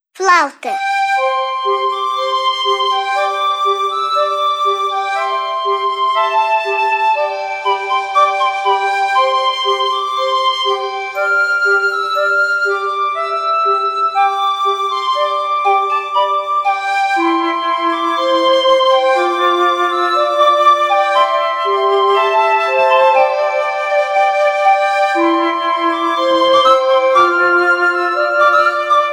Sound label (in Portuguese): FLAUTA